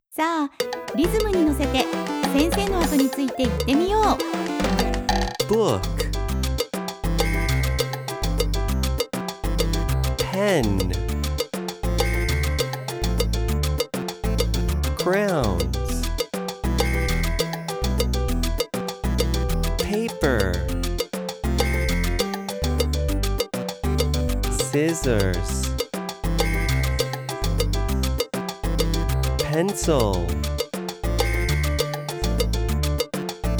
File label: salsa; kindergarten practice